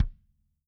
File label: KICK, Living Drums; Sampleheads; Peter Erskine; PB KICK+TOMS